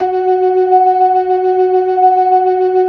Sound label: LO MAN V R, ORGAN LOWER MANUAL